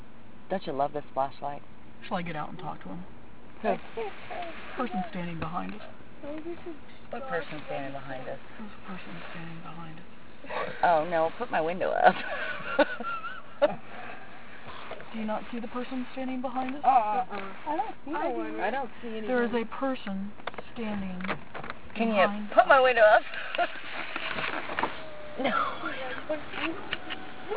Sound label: shadow man and laugher